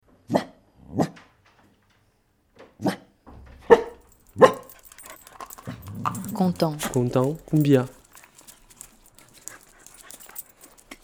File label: cumbia montage